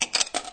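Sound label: Coin In, pinball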